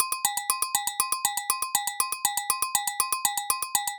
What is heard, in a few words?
Agogo Bells; Sonic Foundry (Sony Creative Software); Joe Vitale Latin Percussion